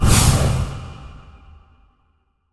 light rune explosive start

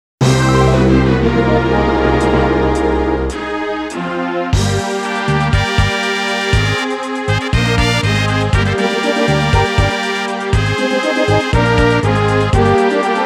Retreat; Wild Arms

